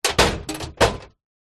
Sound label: vehicles truck ramp metal lift fold